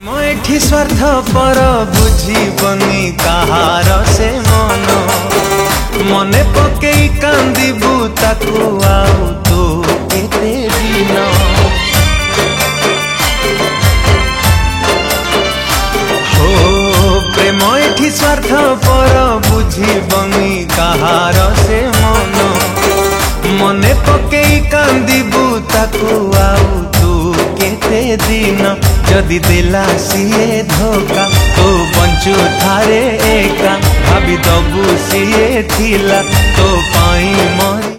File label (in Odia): New Odia Sad Song